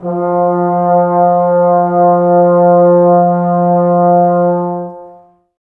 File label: BRS TBS F, Woodwind Brass Ensemble, Brass; Miroslav Vitous - Woodwind Brass Ensemble